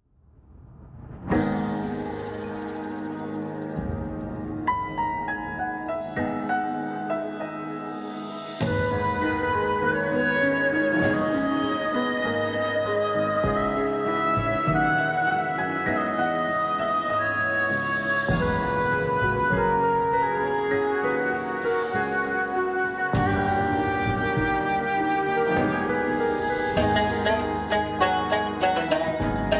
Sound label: Karaoke, 99 BPM; BasserMusic Studios